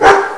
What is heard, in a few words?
woof